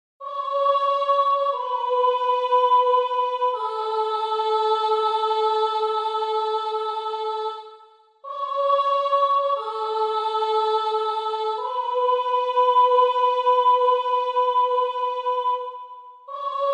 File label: Be the Change Soprano